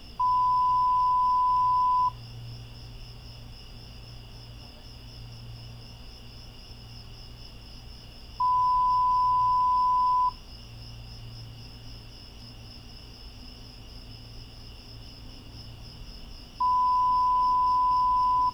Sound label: Noise